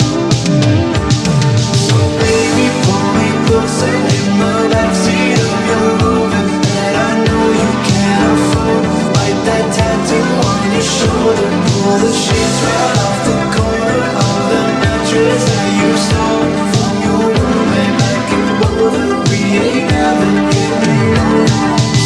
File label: 80s Mix